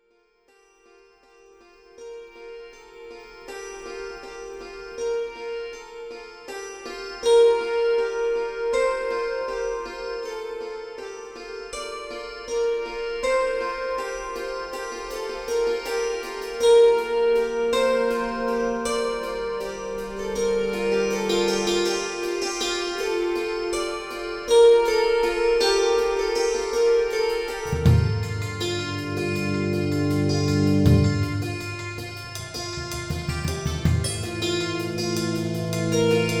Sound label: kantele